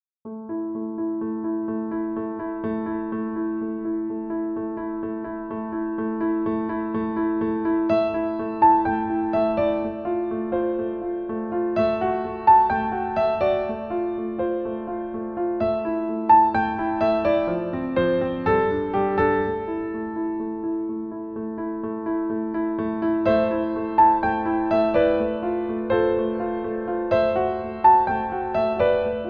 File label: Classical Glass, soundscapes